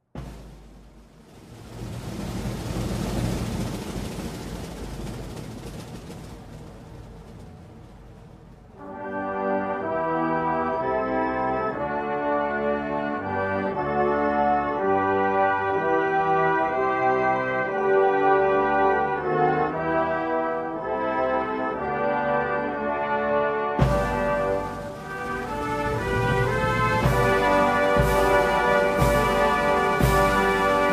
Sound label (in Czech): hymna; uk